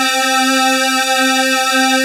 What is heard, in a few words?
CRUMAR ORCH, ENSONIQ TS-10; Rubber Chicken Software Sample Bank